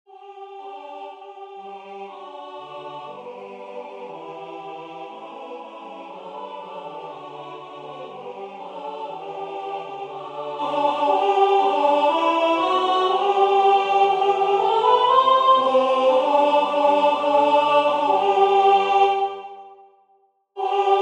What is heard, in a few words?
Alto 1; Charpentier 4 Chorus of Shepherds; Toronto Choral Society